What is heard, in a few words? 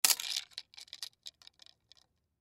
Sun Glasses Drop, Sunglasses Drop Cement; Sunglasses Drop Wobble Cement; Foley; Objects; Blastwave